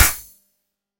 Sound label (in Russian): vozduh rezko vzryivnoy pshik, shprits